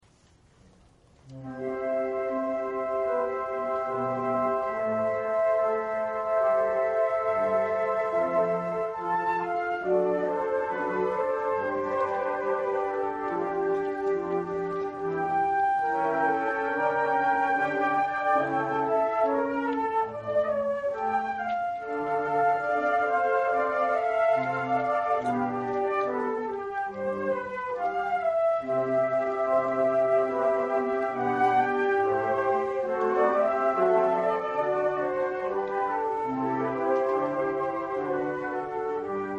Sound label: concert, flute; Newyear concert 2015